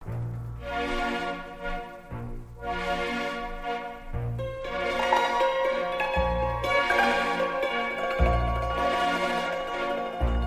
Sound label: Cymbalum